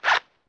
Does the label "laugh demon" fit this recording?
No